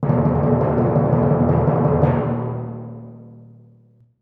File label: timpani roll d#1, percussion; Sonatina Symphonic Orchestra